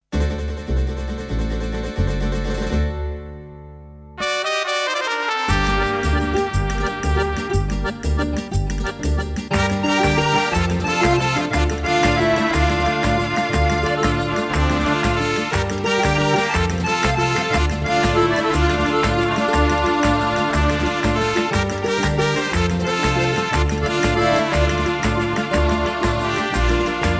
Mexican Story Brass

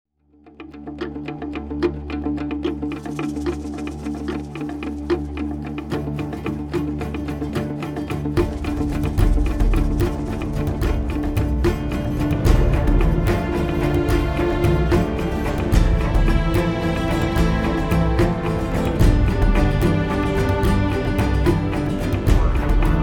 Bottle String Version

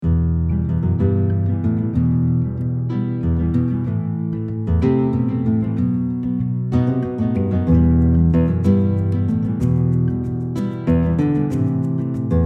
Hands on Wood

